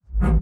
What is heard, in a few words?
BD Gate FX, Bassdrums; Vengeance Samples